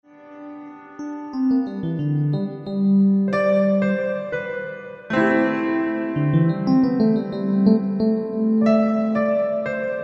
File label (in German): Entspannungsmusik für Babys